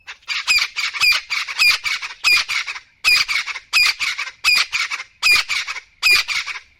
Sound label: Crested Francolin, africa